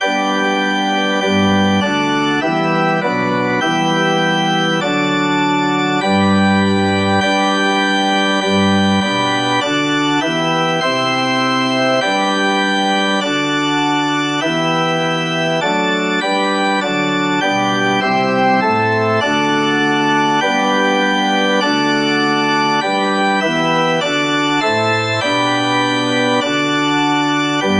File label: all people that on earth, piano